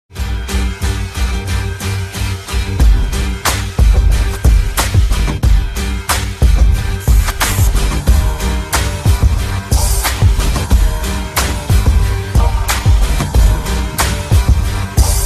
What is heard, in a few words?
panasonic jungle ringtone